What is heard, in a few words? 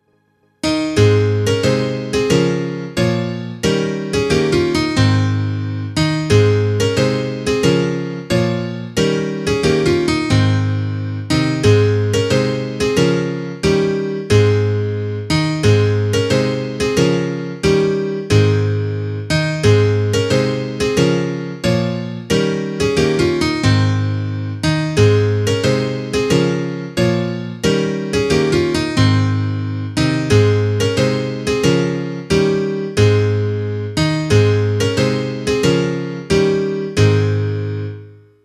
instrumental